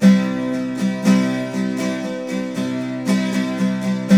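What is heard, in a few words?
12 string major C#, Guitars; Spectrasonics; Hans Zimmer Guitars VOL-1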